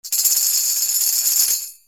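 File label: percussion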